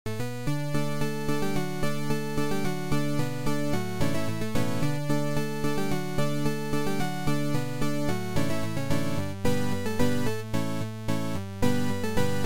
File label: 8-Bit; Andy Griffith